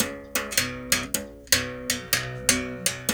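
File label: 79 bass berimbau phrase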